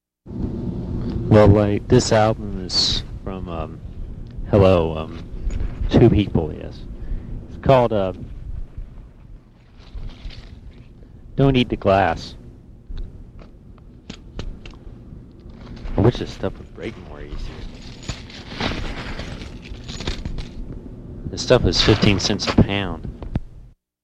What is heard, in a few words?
Glass; Karl Rehn; Mixed Web Samples